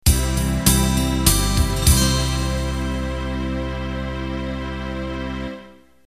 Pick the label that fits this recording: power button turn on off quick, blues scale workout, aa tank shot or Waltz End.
Waltz End